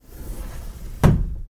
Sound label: Fusuma